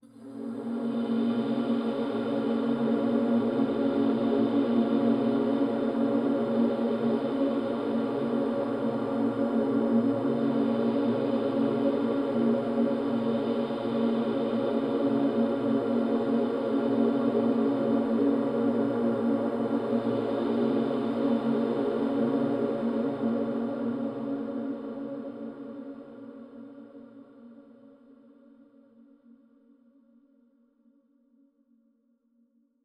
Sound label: Pad; neuro; InsideInfo